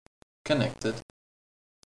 Connect; Netbattleship

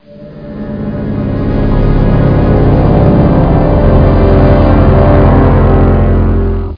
Node Up

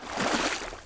wading; The Sum of All Fears

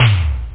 doom drum, drumkit; OctaMED SoundStudio V1

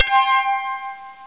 ting short

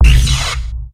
Dragon Bound; FantasyTennis

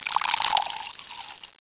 wine workshop; Caesar3

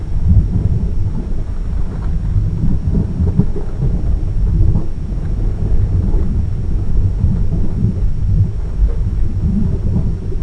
LAVA FLOW; Renaissance

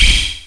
Pshhh; DragThing 4.0.2

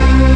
guitar; Descent II

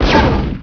shell; acdemo; AC Demo 3-14-98 RipCord

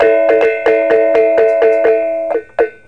reong sound, GAMRAN; Epson

